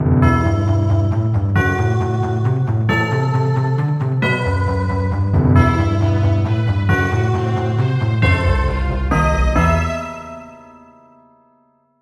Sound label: ringtone bells